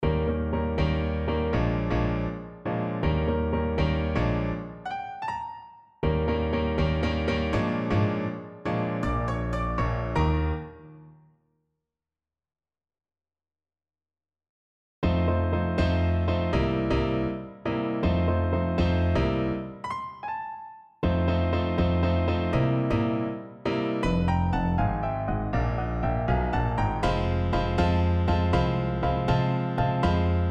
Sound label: seductive dance score; Ayesha's seductive dance score; The Unsinkable Titanic